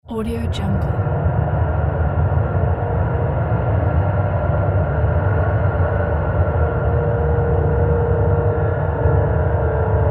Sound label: eerie soundscape